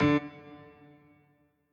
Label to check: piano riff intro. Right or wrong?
Right